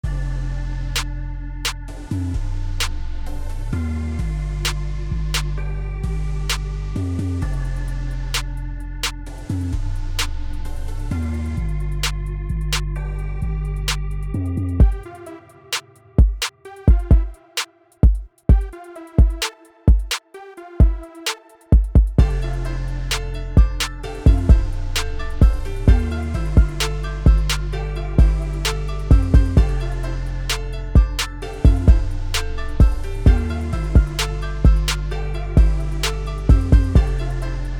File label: Instrumental